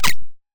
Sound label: mouse, creatures; Oryx